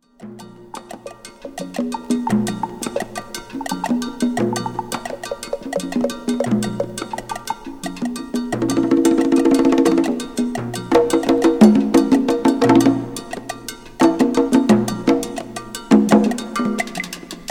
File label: total art of percussion